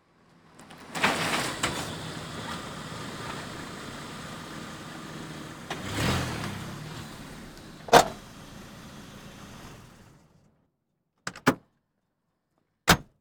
Automobiles various

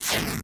FABRIC Tear; FABRIC & CLOTHING; mono; Universal Sound FX

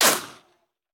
Bullet Ricochet; Sound Ideas; Guns

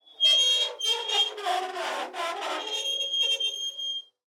X-Ray Machine; Blastwave

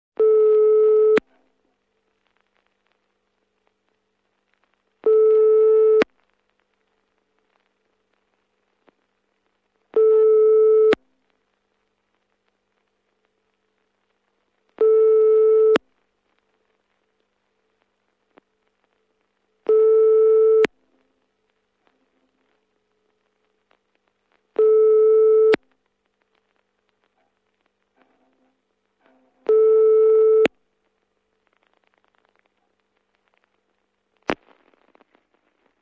Telephone, European Ring And Pick Up, Foreign; Office; Sound Ideas; Series 2000

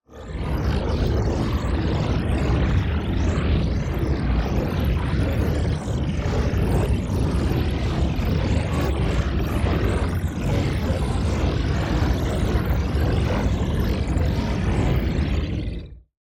TEXTURE; Stream Of Digits; boom library; Matter

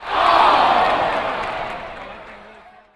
BMX Crowd Reaction "Oh"; Crowds

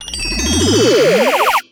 Pickup Magic Bells; Pickups

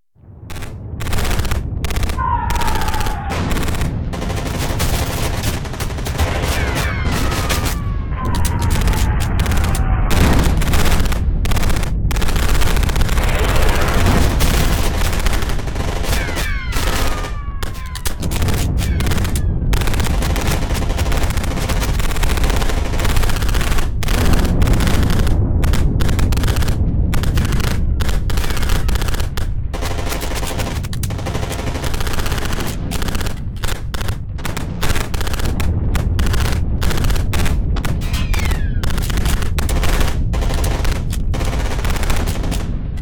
Battle, Foxhole Battle, Heavy Gunfire; gun and weapon sounds; captain audio; fully loaded

